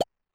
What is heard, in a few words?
collect; grunk; tools